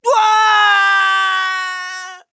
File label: Falling; Koga; Paladins